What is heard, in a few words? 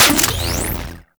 Shotgun Mech, Weapons; Warframe; Corpus